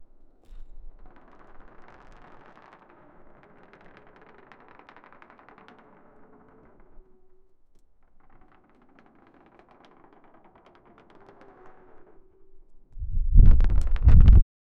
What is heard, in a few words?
baseball rolling on the